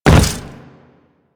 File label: Step Default, Footsteps; Warframe; Grineer